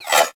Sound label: Laser Whizzby; Warframe; Bullet Impacts; Whizzbys